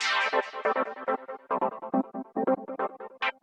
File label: TECHNO EXTRA 140